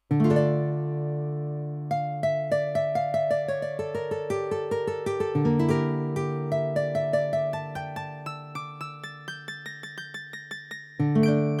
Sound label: Guitar